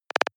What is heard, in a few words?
noise clicks splutter low; maschinen; signale; soundpool